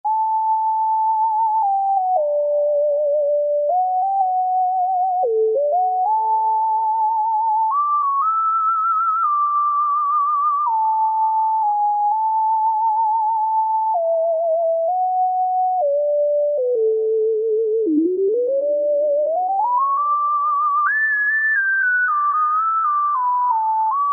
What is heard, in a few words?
Sine Of Time